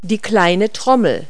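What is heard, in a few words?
snare drum; Multi-Lingual Talking Picture Dictionary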